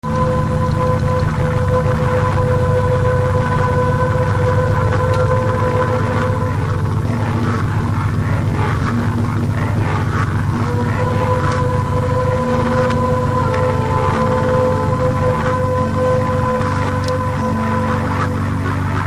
female version

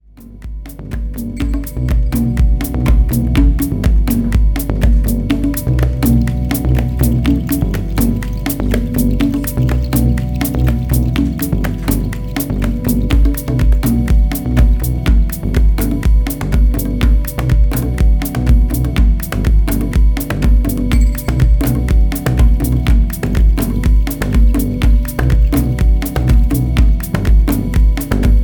Reverence (Drum Meditation)

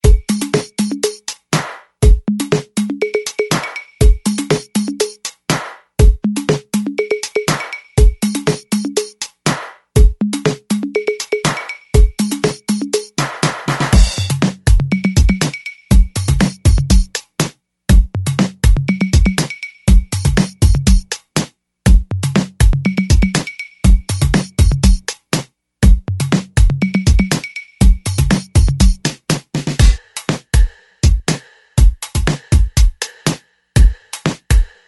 Stop & Go (Drums)

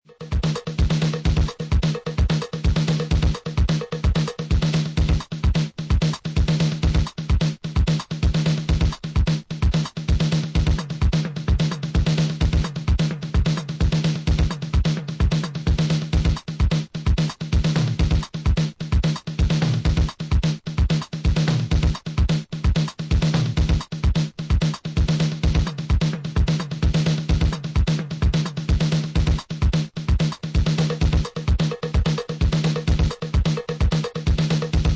drums

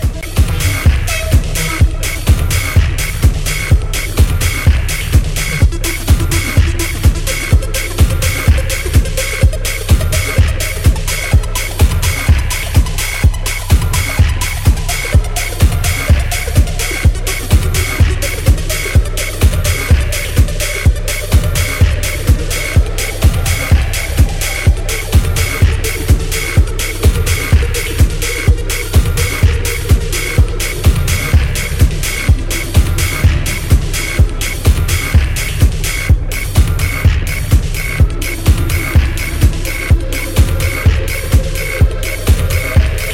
Piano Track

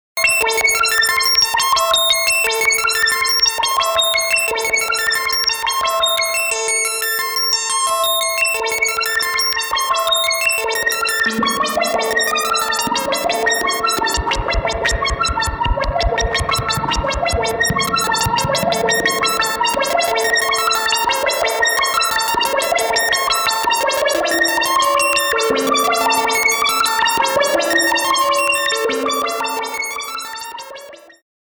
Arpeggio

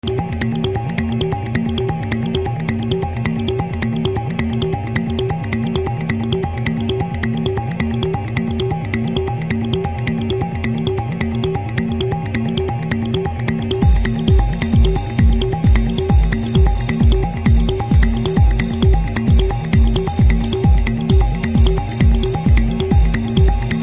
Static Signal

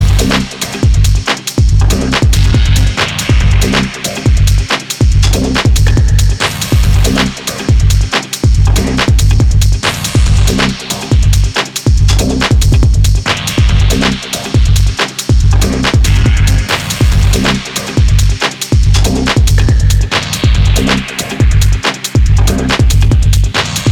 Mi Bass

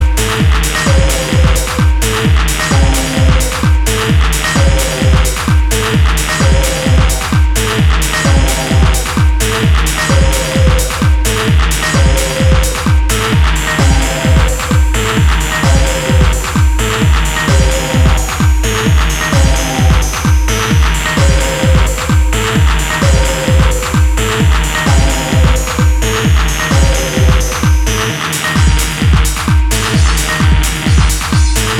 I Love Kick Drums

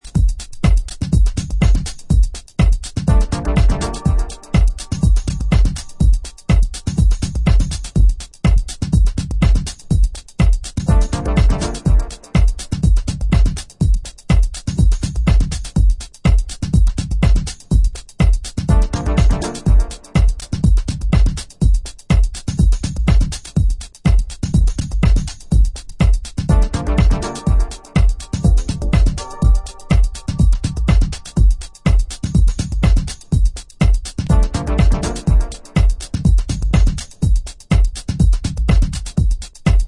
Instrumental

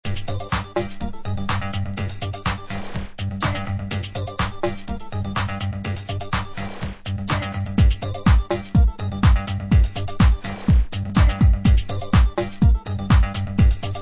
jet bass (hybrid house mix)

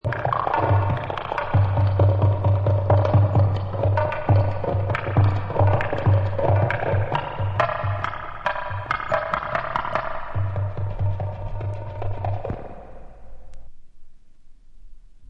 drum track with reverb; green drum track with reverb

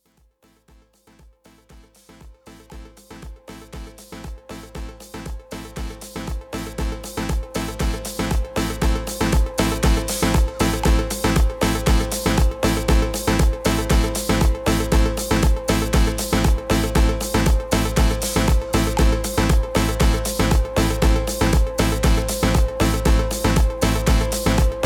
bright piano

